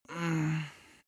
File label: male sigh